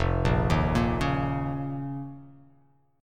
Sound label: F 7th Suspended 4th Sharp 5th F down; F7sus4#5; arpeggiated; JGuitar